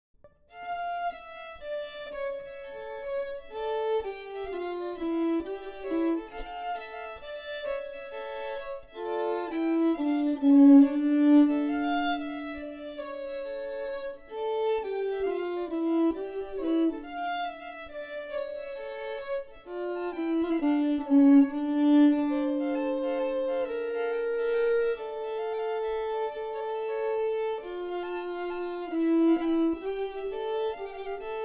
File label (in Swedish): Blåsstämma; Klockarpolskan